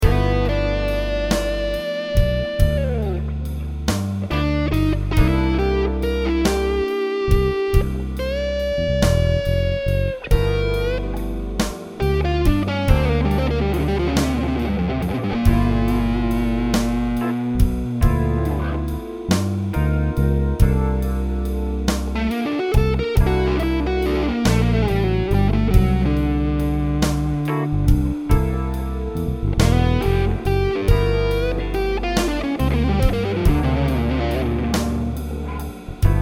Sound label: Slow blues; Rbass 5 string bass, Fender Precision bass Vintage ‘62